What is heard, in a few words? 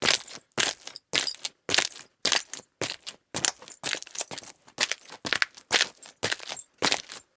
Walking on glass